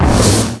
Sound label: thunderbolt; spells